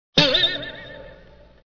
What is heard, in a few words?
boing